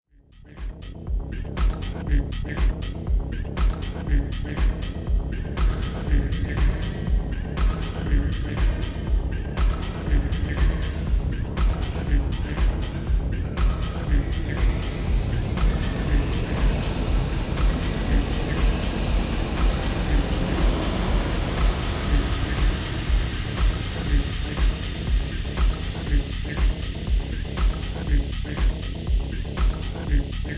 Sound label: Pitch Verb; Xone PX5